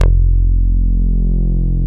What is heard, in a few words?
Synth Bass, Roland